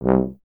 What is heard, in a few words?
tuba; Miroslav Vitous - Solo Instruments VOL-2